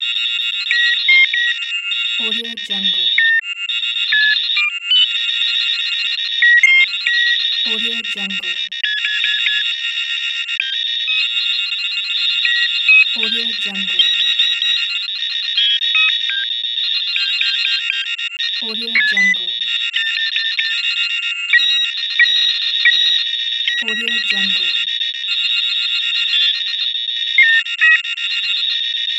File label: old computer calculations loop